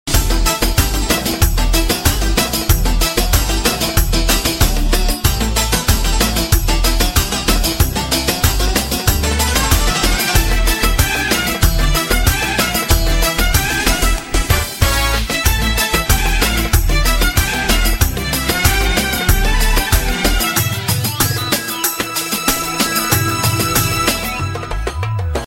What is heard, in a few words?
arab melody